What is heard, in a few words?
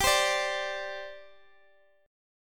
G Suspended 4th, Guitar, DADGAD